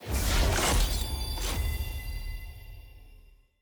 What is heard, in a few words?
reveal permanent rarity mythic; loot